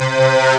B synth; ST-XX Amiga Soundtracker Sample Packs

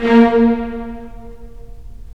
STR, Roland; Roland - LA Composer vol. 4; Full Short

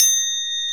Crotales, Orchestral Perc; Roland LCDP03 Orchestral Perc

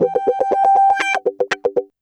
120 GROO WAH, Electric & Acoustic Guitar Loops; USB Soundscan vol.04; AKAI

